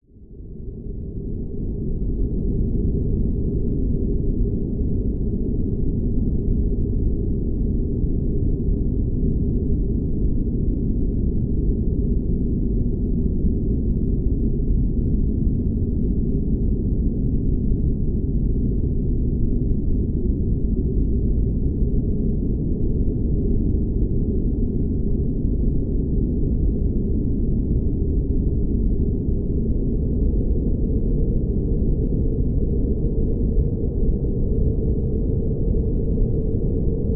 science fiction space atmosphere drone rumbling airy outerspace